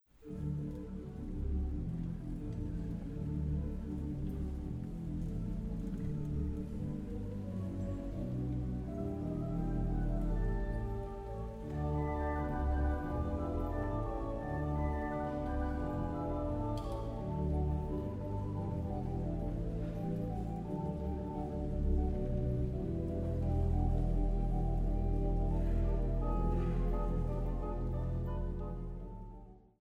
orgelimprovisatie en samenzang psalm 47

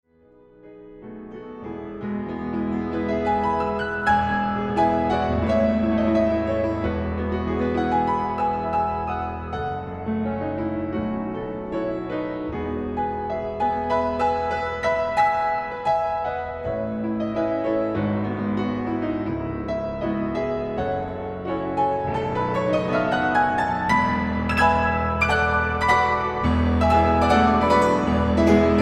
pianosolo

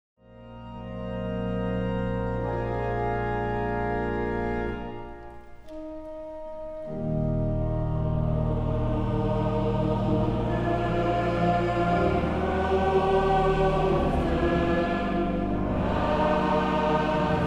inleidend orgelspel met aansluitend samenzang; psalm 42 vers 5

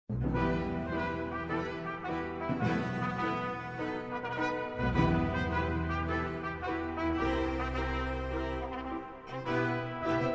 samenzang; psalm

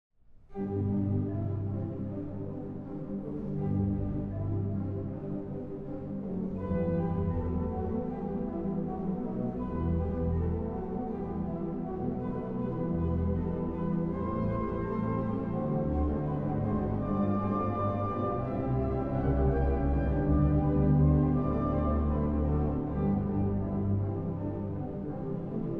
orgelimprovisatie psalm 98 met aansluitend samenzang; vers 1 en 2

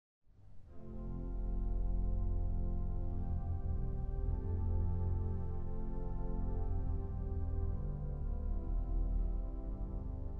orgelimprovisatie psalm 42 aansluitend samenzang; vers 1 en 5; Psalmzang vanuit Rotterdam

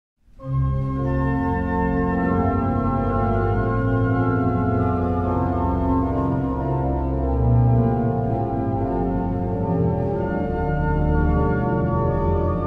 fantasie; over de avondzang; Vincent de Vries Kampen

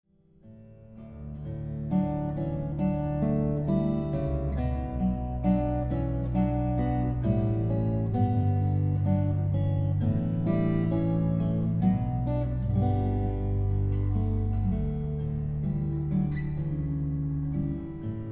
gitaarsolo met orgel

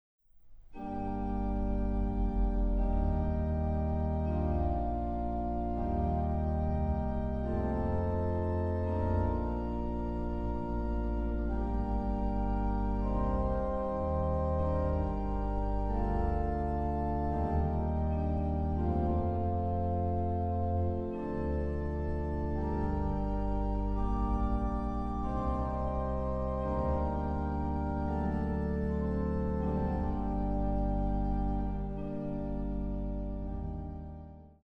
orgelimprovisatie met aansluitend samenzang; lofzang van maria vers 1 en 4

